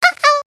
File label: error